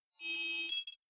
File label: confirm swell click